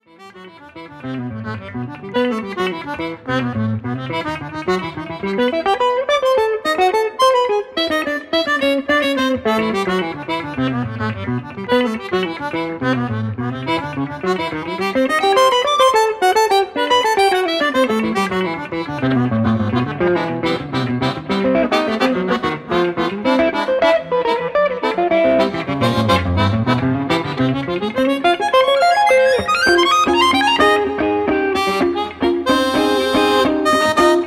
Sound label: choro